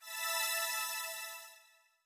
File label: Single Tile Appear; UI